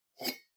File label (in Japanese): タンブラーを取る音